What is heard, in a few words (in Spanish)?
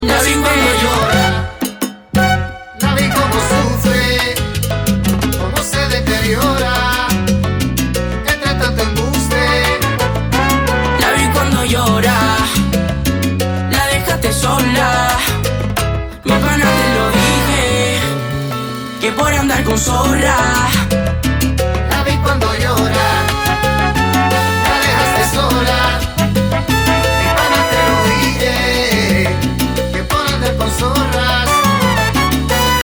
version salsa